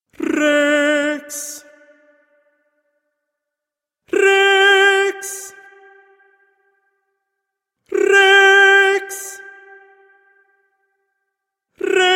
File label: tenor; Rex tremendae